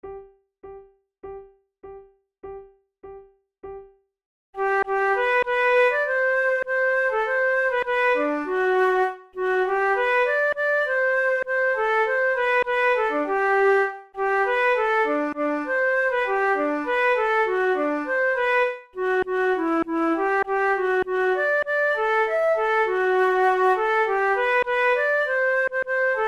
duet; my heart and lute

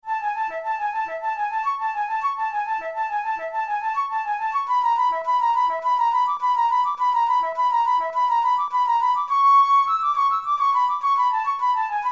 methode pour la flute study; drouet

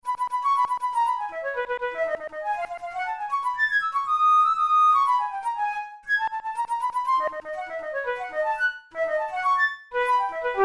studies for flute solo; karg-elert

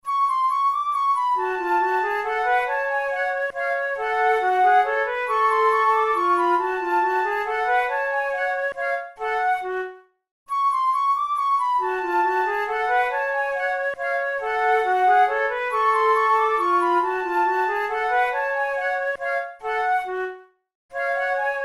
methode de flute; tulou

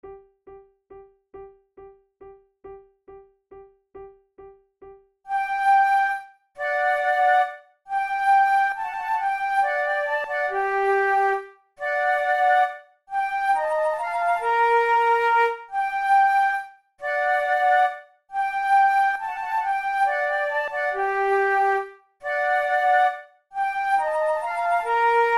flute sonata menuet; mattheson

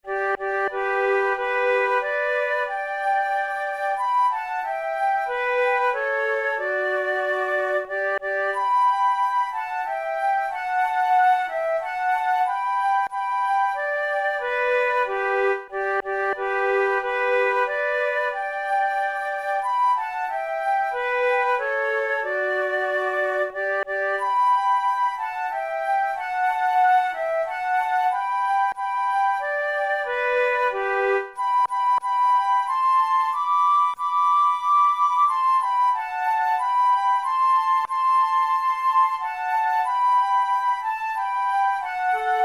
duet

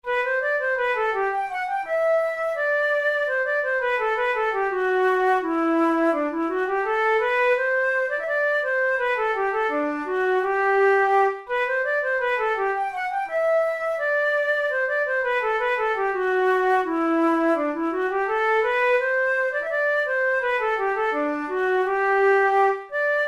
hornpipe

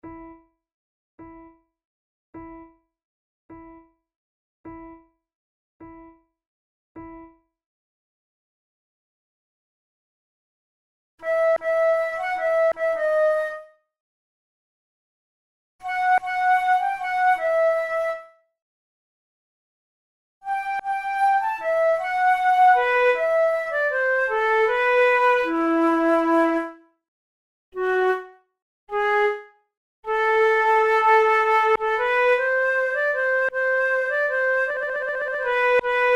two flutes; Monteclair; 6 concerts; plainte en dialogue; flute tunes

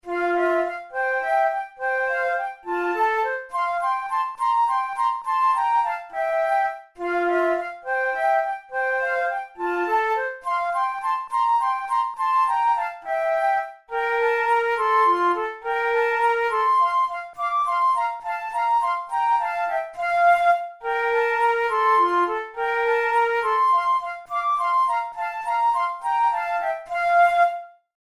pour la flute duos presto; devienne; nouvelle methode

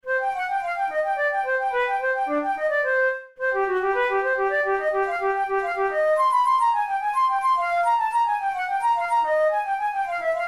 studies for the boehm flute; drouet 72

